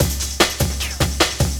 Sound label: LOOP, Drum & Bass, 150 BPM; Ueberschall; Drum & Bass Resonance